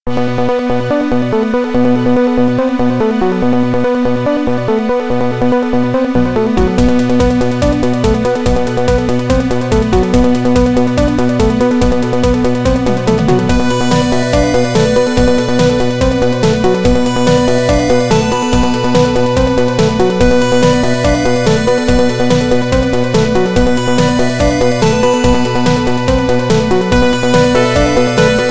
Highway Fury (Sound Blaster Version); zone 66

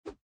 whoosh spear

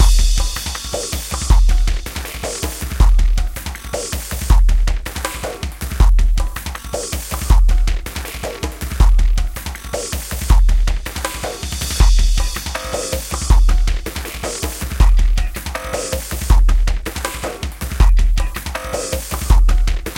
Chase; mirror's edge gamerip

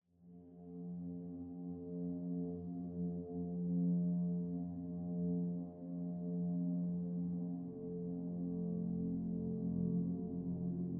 Huge Drum Slams

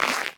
Move Snow Right

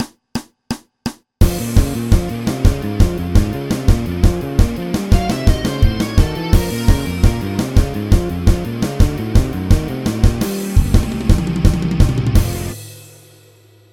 Bass Solo; XF-3; Triangle

